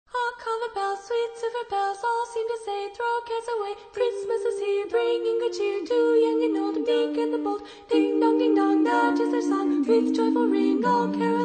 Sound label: Carol Bells